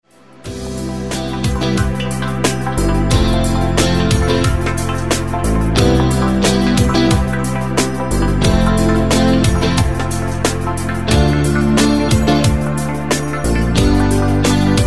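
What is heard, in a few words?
Skripka, violin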